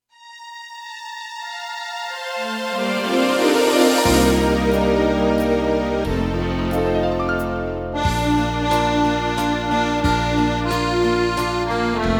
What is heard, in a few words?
Waltz Accordion